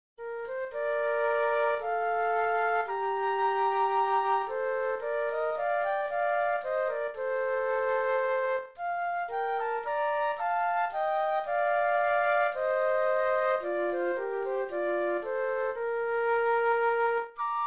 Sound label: March Flute Duet; classical; Handel; Scipione